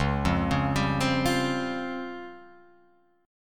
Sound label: C sharp Major Sharp 11th, Guitar, Open F, chord; C#M#11 arpeggiated; 1,0,1,2,3,0; JGuitar